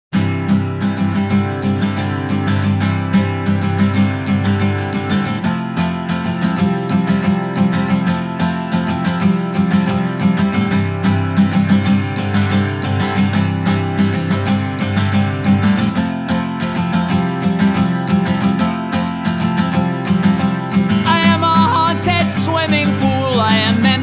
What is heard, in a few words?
swimming pool song